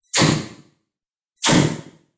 Automatic Pressurized Door Open-Close; Airy Release; Automatic Door Trap Door Pressurized Door Airy Release Open - Close Scifi Science Fiction; Doors; BBFX

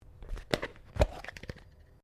JAR PLASTIC LID PLASTIC SCREW OFF; Various kitchen jars; Twists; Objects

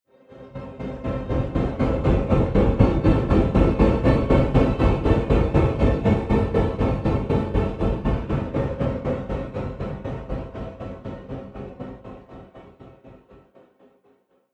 Horror Banging Interlude; Sci Fi Sound Effects; Sf Horror; Andrew Fleming

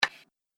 Game Piece Slide; Slide Sound Effects; object sound effects

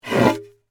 Wood Plank Up, Pick, Up, 2X6; Materials

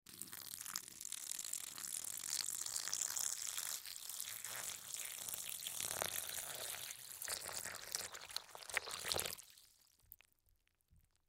Cereal Pour Milk, Cereal Pour Milk In Bowl Food; Household; Blastwave

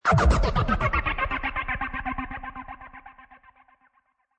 Aliens High, High stuttering alien sound.; Strange Tones; Sound Design

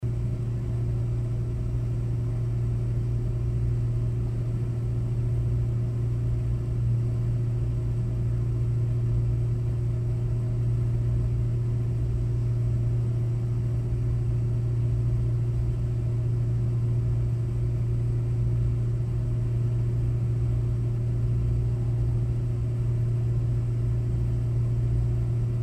Room Tone Auditorium Vents Light Hum; Large Auditorium

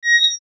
Beep High Pitch; Whoosh Short; Multimedia; Beeps

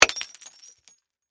Impact Glass Smash, Hard; Smashes (Sound Effects:Objects); BlastWave

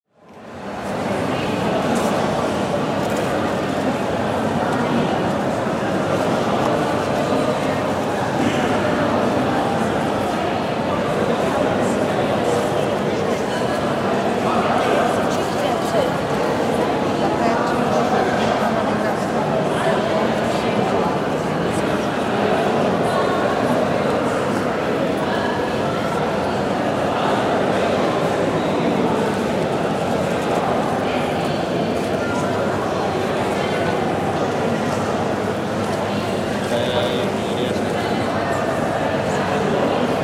Ambience, Crowd, Walla, Interior, Mall; 5.1

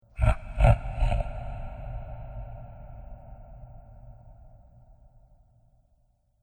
Slow Tired Monster Laughter; Andrew Fleming

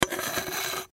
Metal Scrape Hard, Metal Scrape Hard Slide Object; Scrapes; Foley; Blastwave

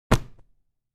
Cloth Character Movement Foley Whoosh

